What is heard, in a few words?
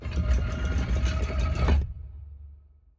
Wall Rasing Up; TANK; 3Souls